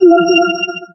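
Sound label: CRYSTAL PICKUP; Forsaken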